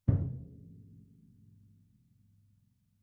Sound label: bass drum muted mp; Struck Membranophones; Bass Drum 3